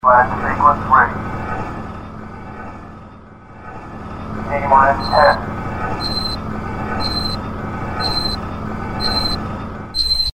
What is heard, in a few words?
land sequence; Dystopia; silo